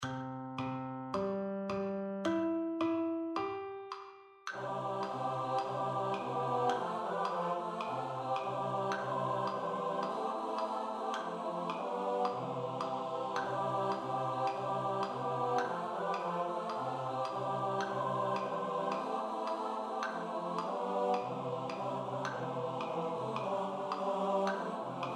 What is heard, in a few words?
SATB STUDY; Education is Our Destination